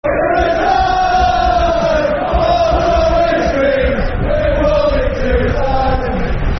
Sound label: Football Chants, Soccer Songs; We've Won It...; Wigan Wafc; fanchants